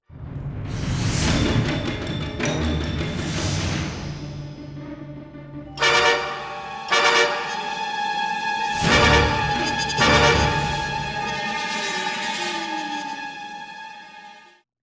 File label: Destroy Dock; Disney Infinity; Omni Ranged